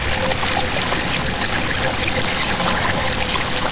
Sewer Water; Finding Nemo; XBOX

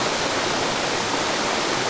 waterfall LG LOOP; Cars; XBOX

